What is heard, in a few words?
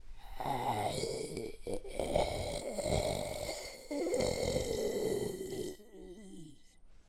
zombie say; mob; minecraft; TBMPack